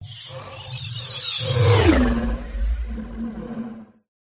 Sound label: whoosh; Audio Mangler